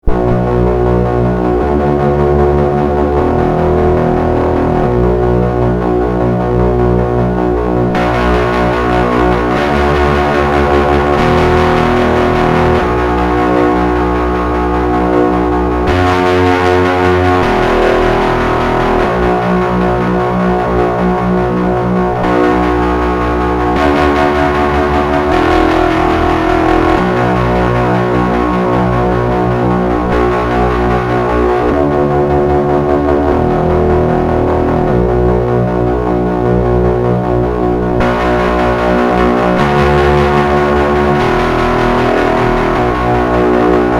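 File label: fuzz guitar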